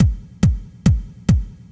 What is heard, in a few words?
DRUM, HOUS-TEC DRUMS; MAGIX